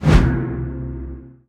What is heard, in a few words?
small swing; sobek; smite